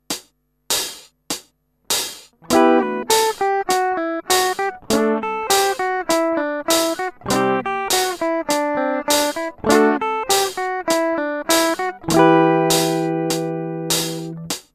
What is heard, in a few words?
finger hard